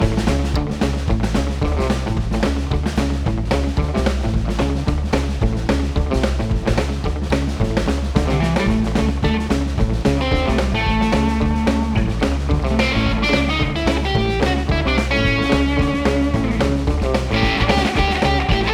Rockabilly; Diner

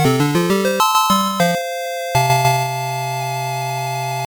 Fail in Training; Kenseiden; Master System

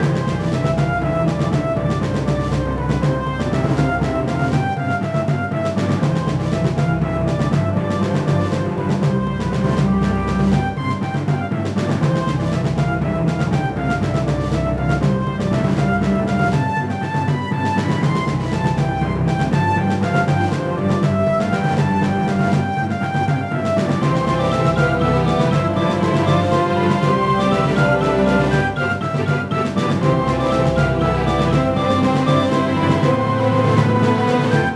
Boss Duel Music Good

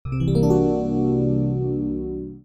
Mystery Jingle; Magical Drop VI

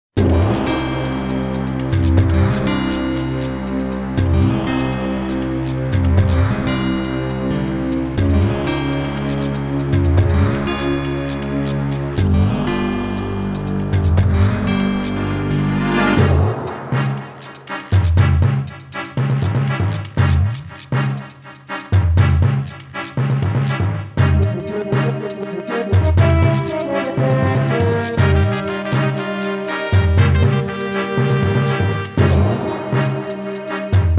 Turbulent Atmosphere; rune factory

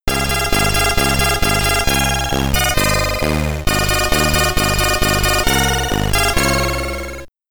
SID Stereo; Kings of the Beach; Commodore 64

